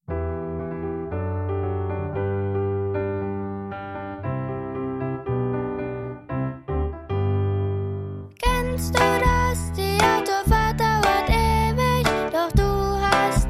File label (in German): Musik bewegt